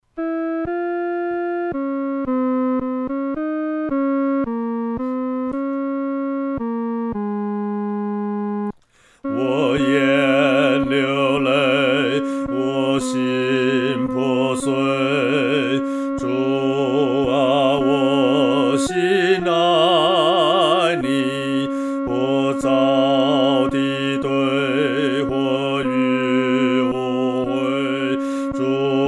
独唱 第二声; 主啊！我心爱袮

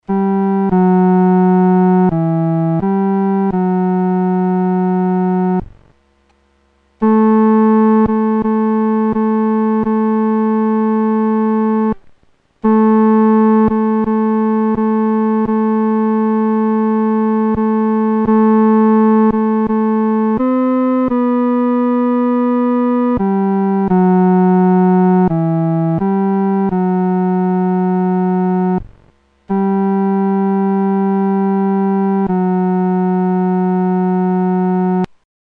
独奏 第三声; 垂听我祷告

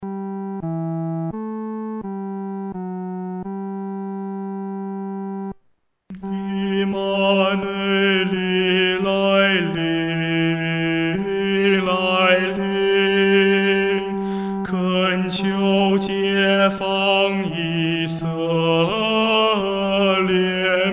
独唱 第三声; 以馬内利来临（一）

